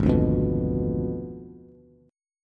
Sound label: Ab Diminished 7th Ab, Bass; Abdim7 strummed; JGuitar